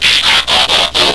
transform dismantle

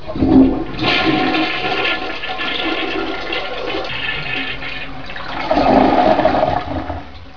Flush

